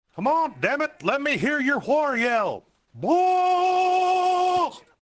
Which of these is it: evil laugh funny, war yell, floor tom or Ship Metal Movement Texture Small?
war yell